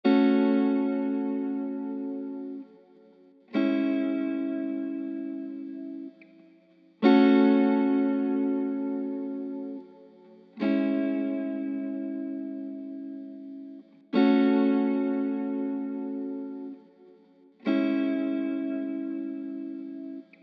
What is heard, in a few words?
68 BPM A Maj Strummed, Guitar; Melodies; Loop Stems; Cymatics; GHOST (Beta Pack); Avenue